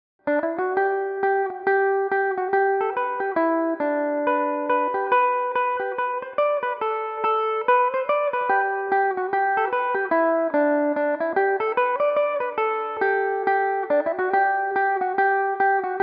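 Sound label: barndance G major, Irish mandolin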